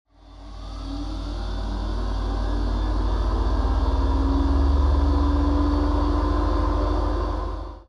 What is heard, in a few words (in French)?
generateur powerup; La-Fabrik